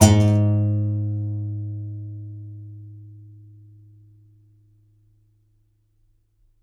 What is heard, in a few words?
C. Bass Balalaika, Plucked Strings